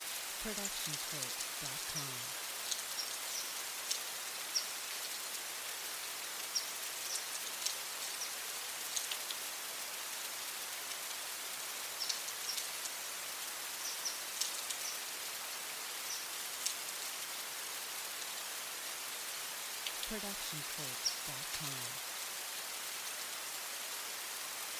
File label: rain with bird chirps; soundscrate